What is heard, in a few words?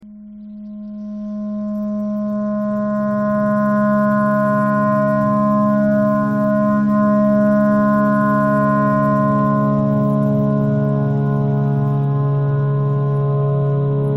tornado siren clarinet simple